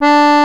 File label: ACCORD D3, Accordions; E-MU Formula 4000 Series Vol. 4; Earth Tones